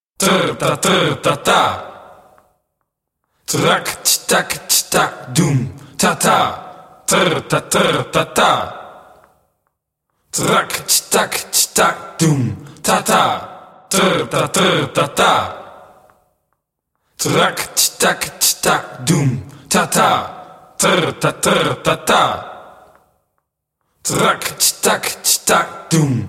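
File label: Turbulent Vocals (slow) spoken, djembe; Djembolale - Fills and Drills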